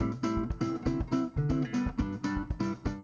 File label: Bossa Nova, ringtone